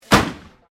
MUSKET FLINTLOCK 50 CAL AMERICAN FRONTIER REPRO FIRING